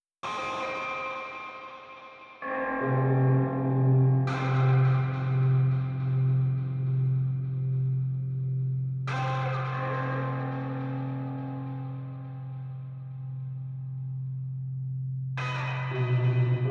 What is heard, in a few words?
Fight Melody; We Happy Few